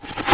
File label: Metal Bucket, Old, Rusty, Set Down On Concrete; Objects, Household